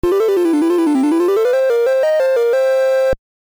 Bomb Disarmed; Clash at Demonhead; nes

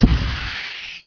BLOW

